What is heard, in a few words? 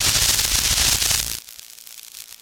Elect Arc Sizzle Fad, arcs & sparks